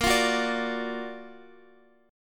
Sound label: F sharp Augmented Major 7th A sharp, chord